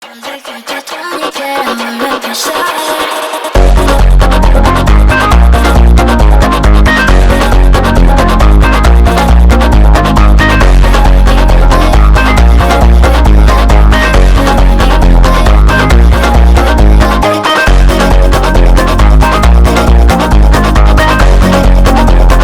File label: Phonk